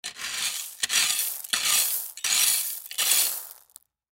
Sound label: Rake Aluminum, Rake Aluminum Metal On Gravel; Yard Tools; Household; Blastwave